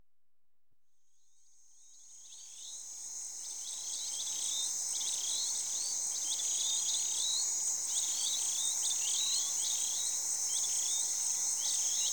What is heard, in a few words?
Senegal, Insects And Birds Savann, Africa, Natural World; BBC Sound Effects Library